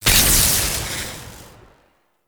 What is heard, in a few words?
Chain Lightning; Warframe; Volt